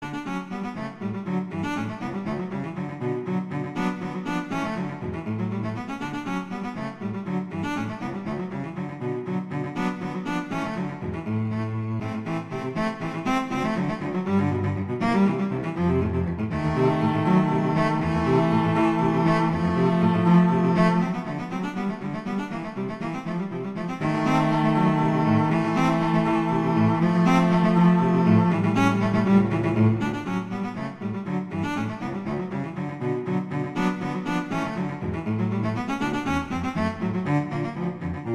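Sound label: Etude, cello; Popper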